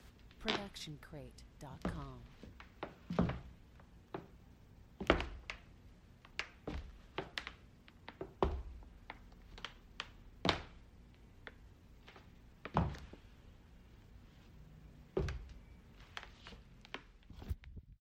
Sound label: footfall wood steps; soundscrate